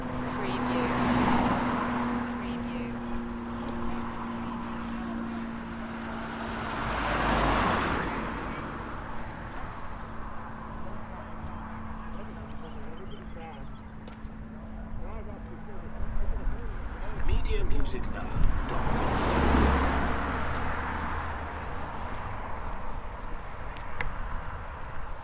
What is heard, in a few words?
Race event, cars passing, light aircraft, people chatter; beanstalk audio